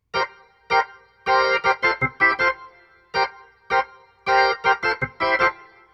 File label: Rotor Riff, Organ